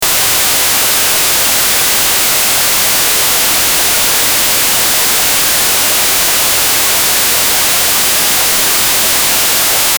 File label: infrasound that triggers, paranoia